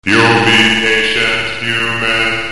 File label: loading